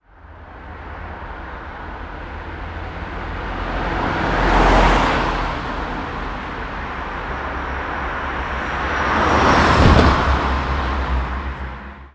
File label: ambience urban traffic night town; sound effects one